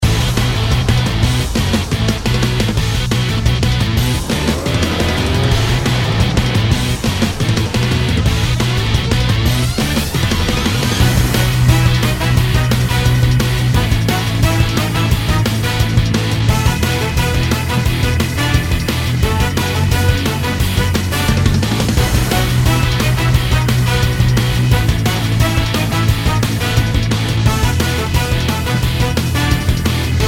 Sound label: Raid Boss; Mist Train Girls